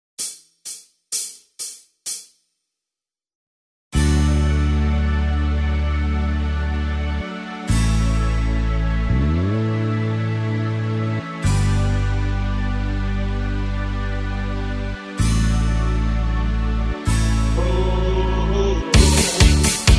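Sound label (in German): Playback Chor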